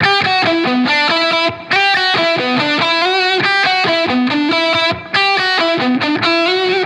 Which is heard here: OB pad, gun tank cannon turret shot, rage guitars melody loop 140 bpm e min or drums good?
rage guitars melody loop 140 bpm e min